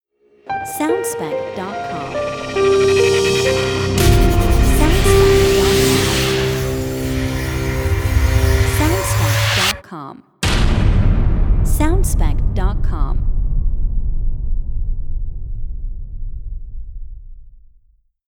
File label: Hybrid Transformation Logo